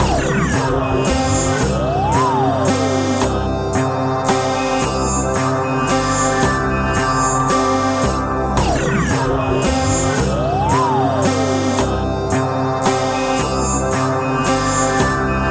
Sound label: Industrial Melody